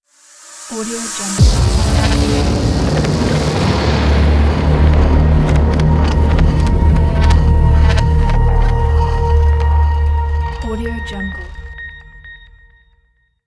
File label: hitech glitch logo